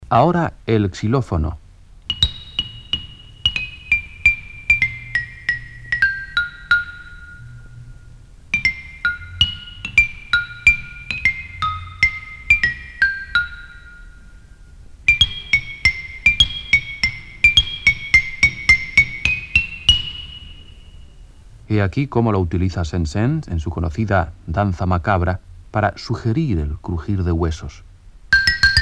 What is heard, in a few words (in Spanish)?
instr xilofono